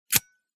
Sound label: Padlock key